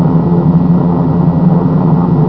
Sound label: eng; BOMBER III™ (DEMO)